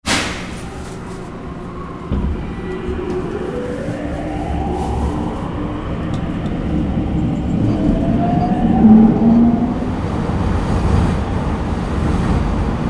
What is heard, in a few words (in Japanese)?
densya